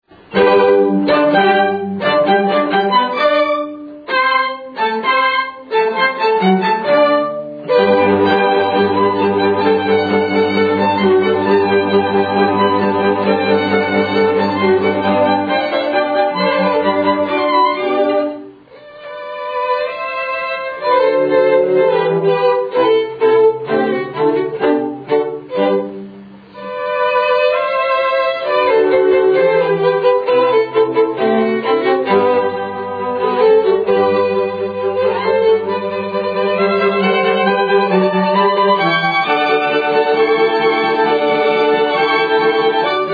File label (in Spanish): pequena serenata mozart cuerdas; Pequeña Serenata Nocturna - Mozart